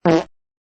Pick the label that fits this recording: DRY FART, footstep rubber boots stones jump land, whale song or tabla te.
DRY FART